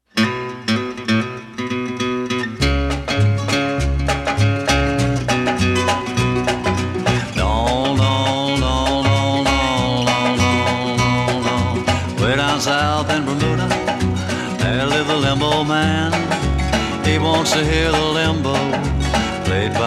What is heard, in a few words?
Country Guitar